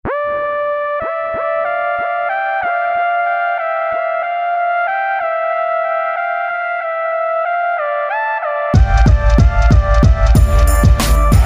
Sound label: Beats